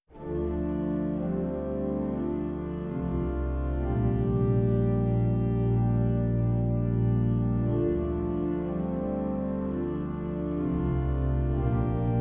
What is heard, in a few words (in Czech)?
Ó náš milý Bože, Zpěvníku; Dodatek Zpěvníku CČSH